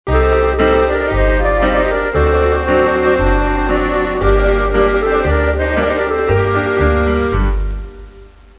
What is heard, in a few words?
Anthem